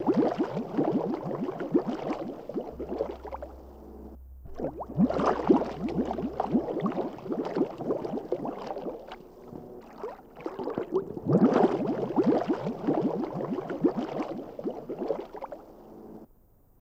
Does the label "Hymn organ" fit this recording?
No